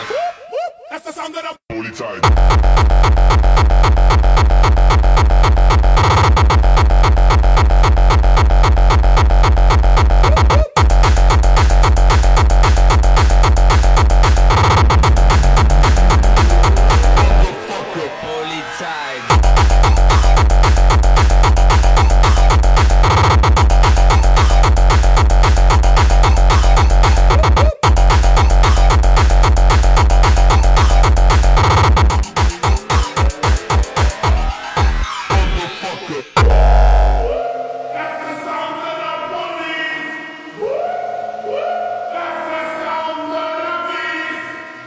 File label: music